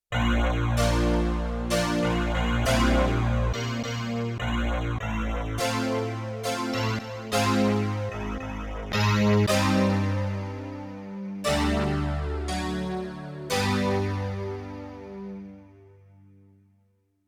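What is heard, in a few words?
Metal-Clavinet-Unisono, Yamaha TX16W; Spacey Keys 2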